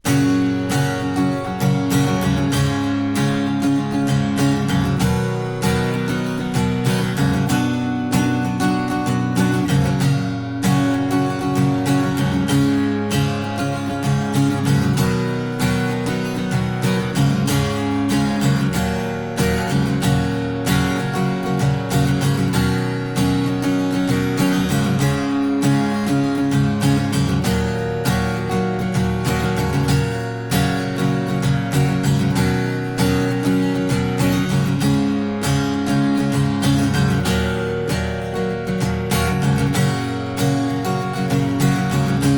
acoustic; Musicus

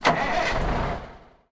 vehicle dumptruck ignition; teardown; ps5 windows xbox series xs

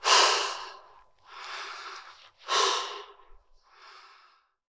Prisoner Catch Breath

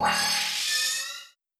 Bootsound

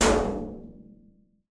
metal hit

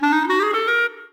Clarinet Missednot; Levels Hub Diner; Light Buford

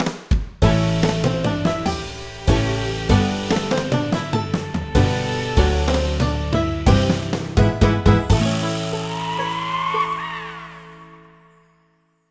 Win Stinger

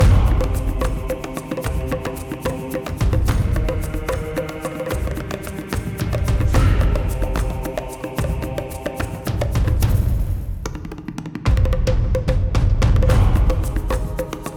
Garden Main

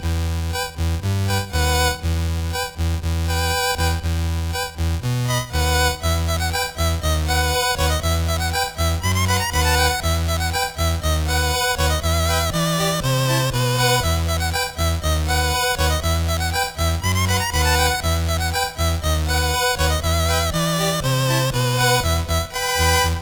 music kingdom ballroom loop; Skellboy

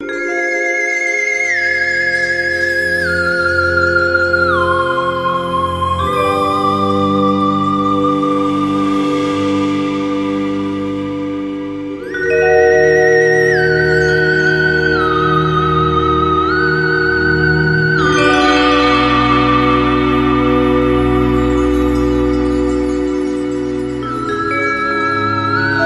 Shortloop Cinematic eeery; tales from space mutant blobs attack

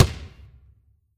Special Basketball Bounce; Sfx - Special Basketball Bounce 04

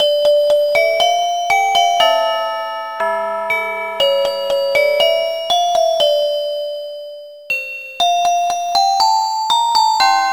gameover; witch bot meglilo windows gamerip 2009

